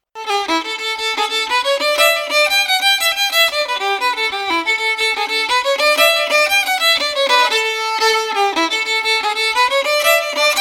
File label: rakes of kildare fiddle; tune tutor; SeamusGrantFestival